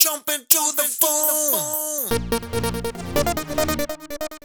synth

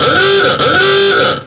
HORN BLOW

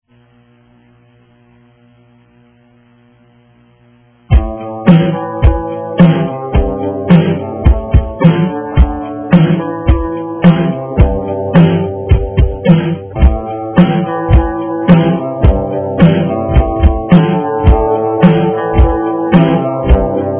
delay

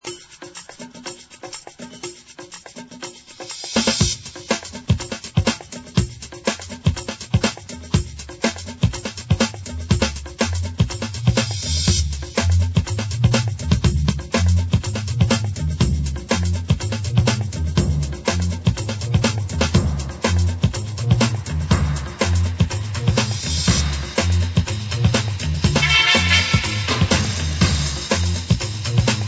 science fiction jazz

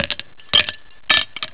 ice in glass

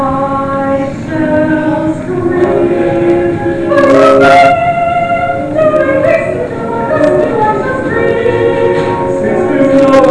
scream

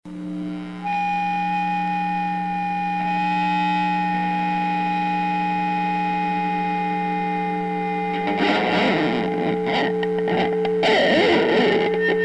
guitar

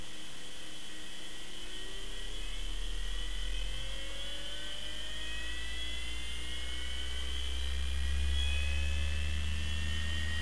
drive

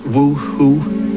woohoo

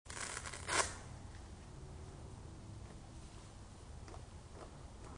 Humming

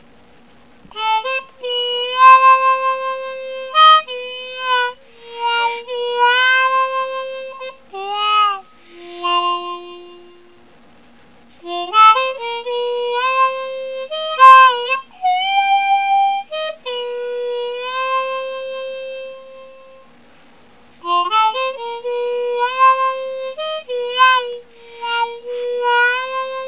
weary blues

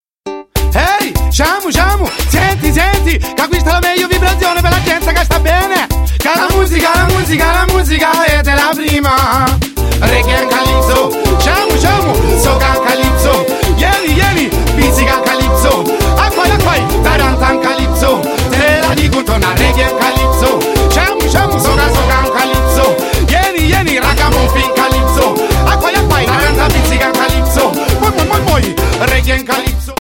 reggae calypso

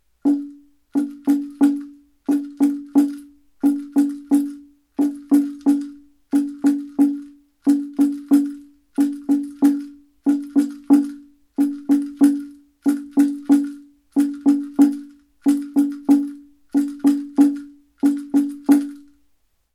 Chippewa Drum Rattle

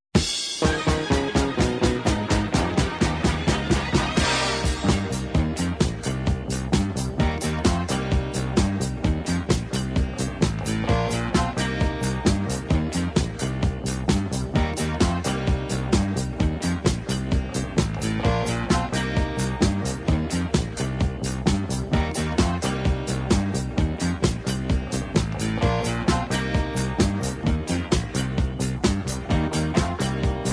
nail bomb

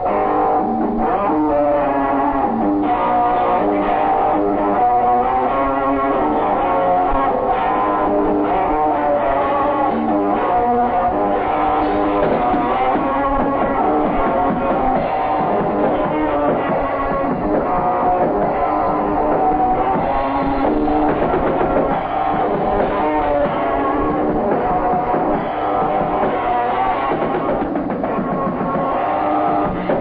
riff

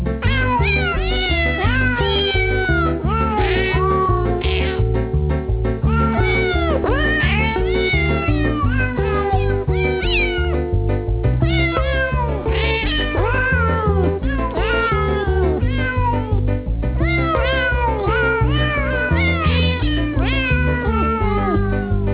cat sing

